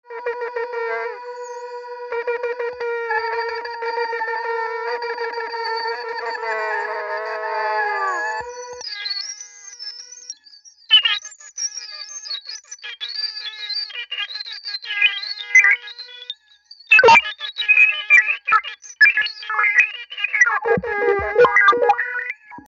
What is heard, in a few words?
Shofar; eShofar